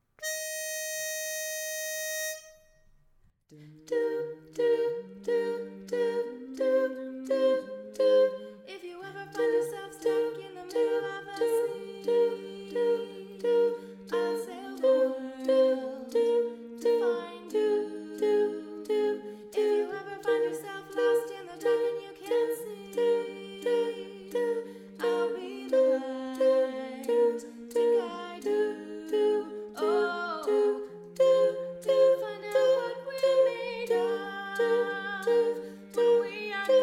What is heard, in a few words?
Tenor; Intro, Verse 1, Chorus 1